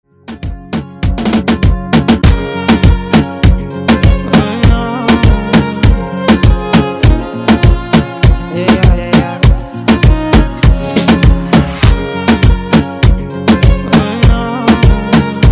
Afro Moombah Redrum

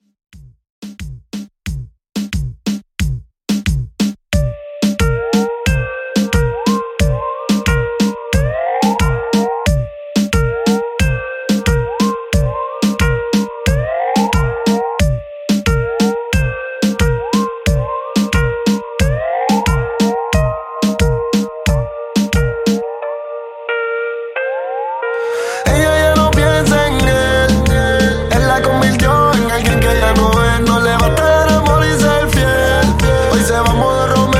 Reton 90Bpm

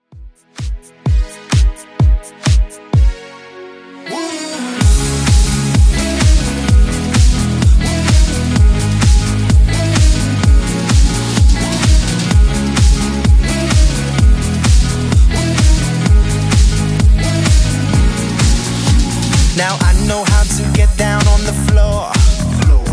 2ks Redrum 128bpm

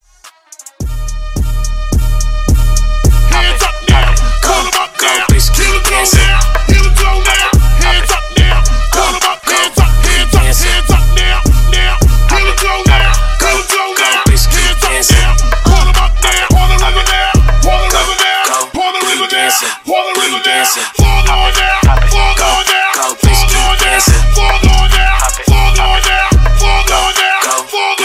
Acap Ins. Hype Breakz 107 BPM

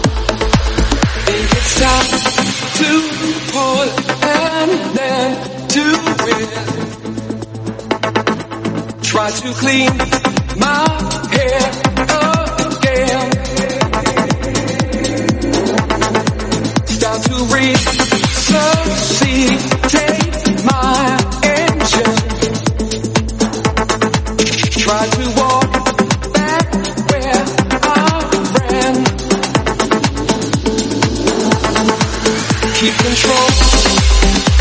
122bpm Am

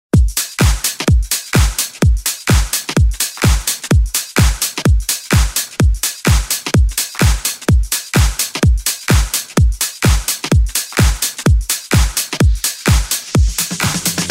70s Dance Redrum Clean

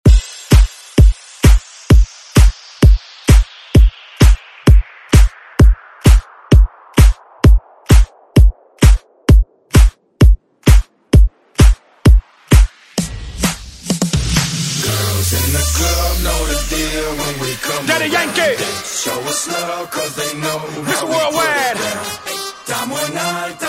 Latin Dance; Redrum

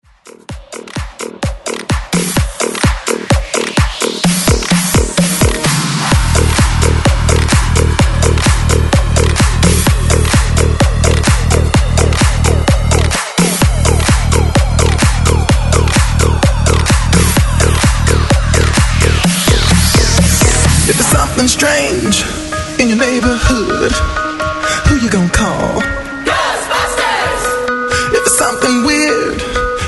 Bigroom Smasher

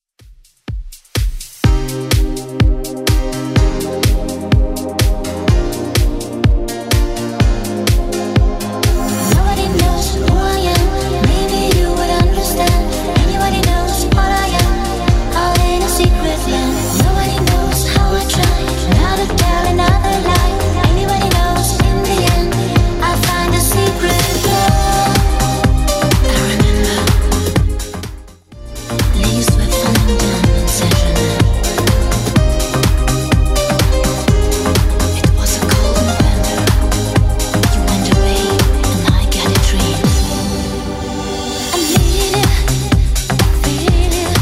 90s Redrum, 125 bpm

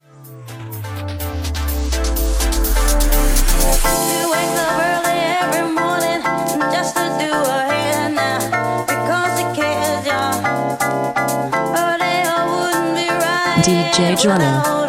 Dm

